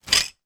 foley broken dish pieces in bag pick up; zapsplat

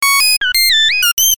science fiction computer glitch or malfunction

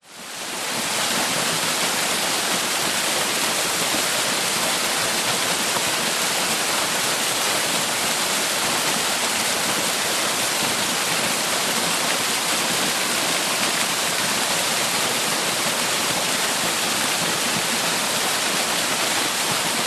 waterfall base large flow hit rock short version; nature

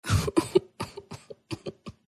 human FEMALE sustained cry sob

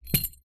sport whistle metal place down on soft surface

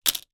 toy plastic handcuffs put down on table

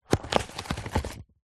food chocolate box open

